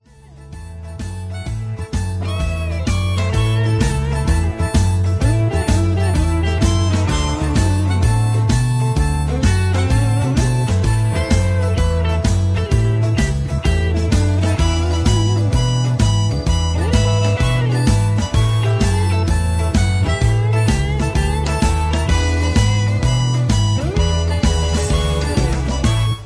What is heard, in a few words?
High Key